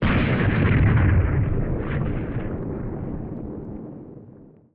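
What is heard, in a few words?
thunder strike lightning explosion; ambience and background fx; nova sound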